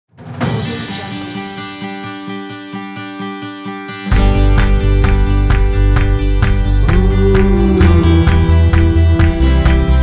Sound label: inspiring indie folk pack